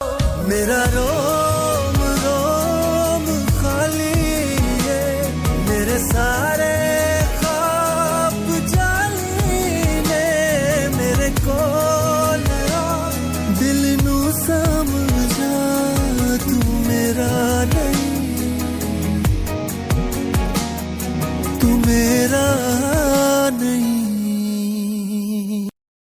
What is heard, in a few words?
OST pakistani ringtone; Azmaish